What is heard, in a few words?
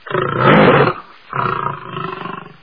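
growling and roar of a predatory cat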